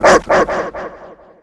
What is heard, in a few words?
Dog bark Stun